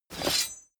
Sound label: blade small pickup